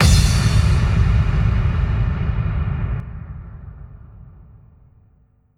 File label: cymbal long echo softer; osu